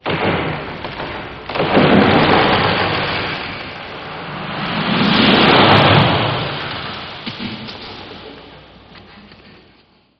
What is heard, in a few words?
Woods - Mysterious Sounds